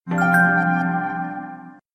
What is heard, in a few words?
ps4 shutdown